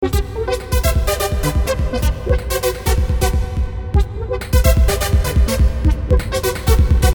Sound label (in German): frickeltrance